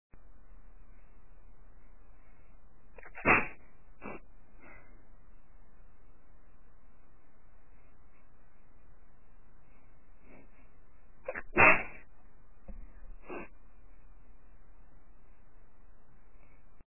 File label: short double, female